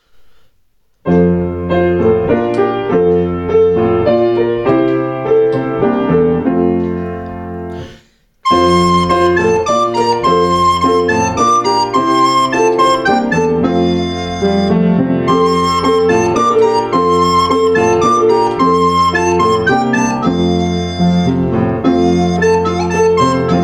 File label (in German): kommet ihr hirten instrumental; engelschor lieder